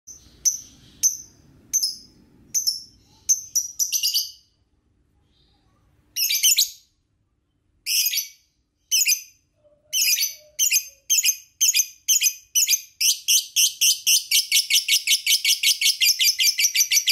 pancingan lovebird ngetik ngekek panjang ampuh gacor

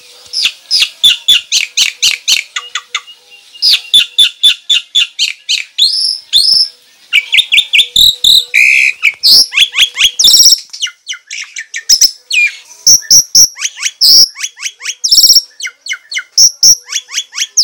suara jalak putih jantan

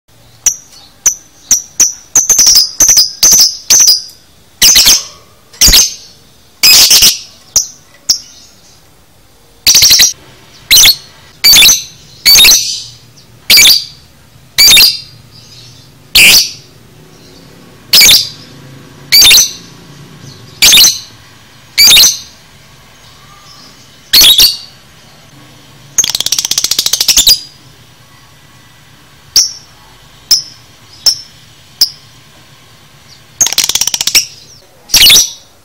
masteran lovebird

